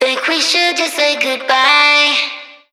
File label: phrase Dm, Vocal